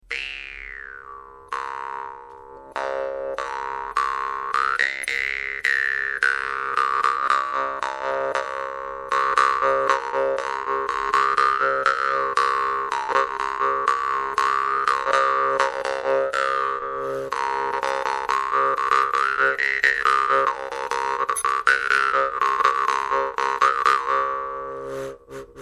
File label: belorus vargan middle